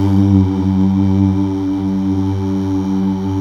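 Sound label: Male Uuh; Best Service Gigapack 1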